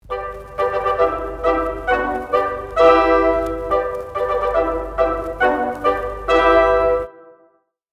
March 1 Layer, a melody harmonized in a chorale style; Rule of Three; The Nutcracker